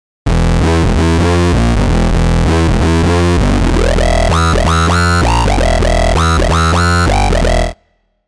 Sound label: mod - pwm